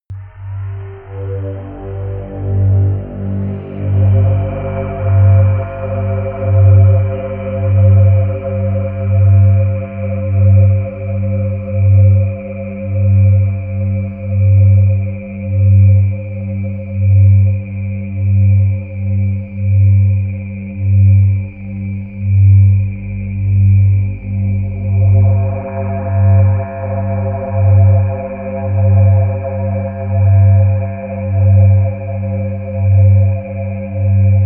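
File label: monotonous whir, pads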